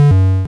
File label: lose; pong